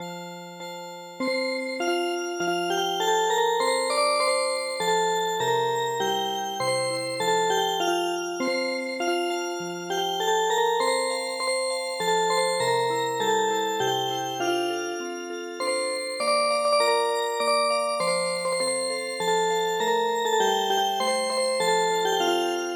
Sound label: Music Box, traditional; The Bold Fisherman